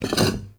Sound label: Vase Medium Slide; Objects; Vases